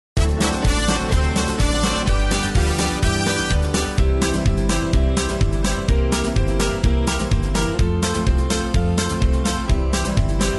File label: victory in jesus rhythm track, square dance music